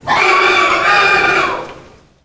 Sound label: pig chase; A Machine for Pigs